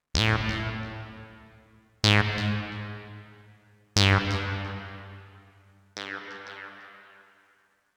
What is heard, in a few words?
Oneshot Synth